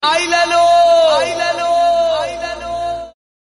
odiya bhajan tone

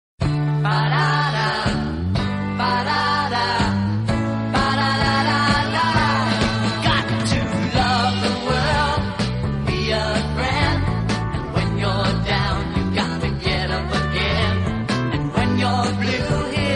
music; Scooby-Doo

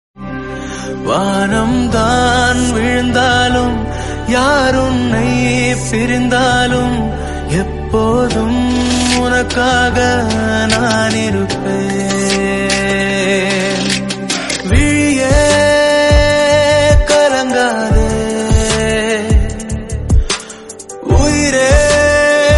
romantic song

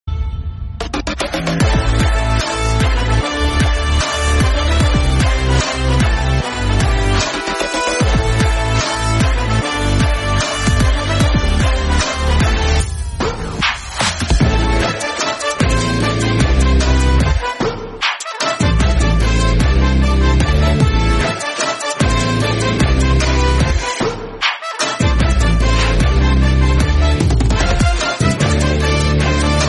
bgm; jailer; ntr 30

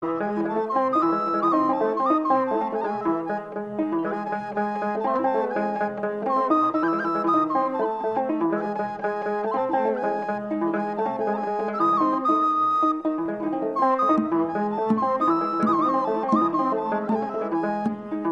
ethiopia mezmur instrumental music

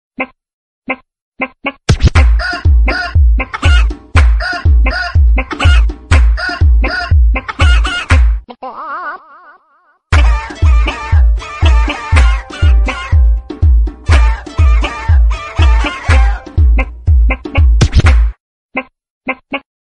funny chicken

